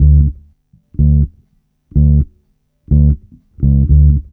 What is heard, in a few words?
tar bass, Roots Disco; USB Soundscan vol.32; AKAI